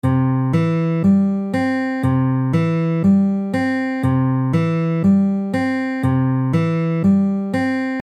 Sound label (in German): fingerpicking beginners, westerngitarre; fingerpicking westerngitarre lernen guide